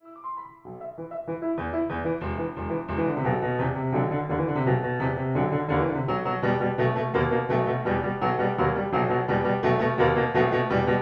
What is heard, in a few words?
Raindrops